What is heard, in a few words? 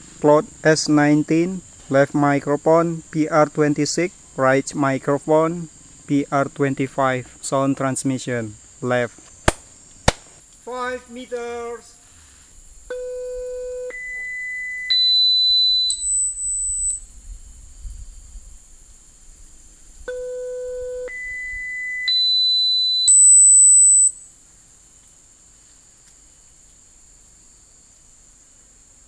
birds